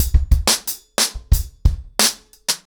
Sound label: ACOUSTIC DRUMS, 90 BPM